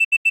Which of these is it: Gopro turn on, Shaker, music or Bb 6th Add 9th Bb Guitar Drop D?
Gopro turn on